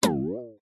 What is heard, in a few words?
cartoon pluck twank spring